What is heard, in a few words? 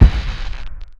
forcefield kick